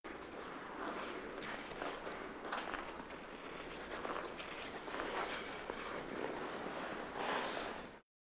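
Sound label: Hockey Distant Skate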